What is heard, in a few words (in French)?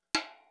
Repinique baguette sur rim